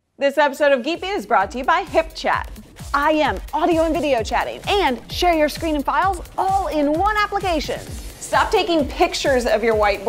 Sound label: whiteboard; Geekbeat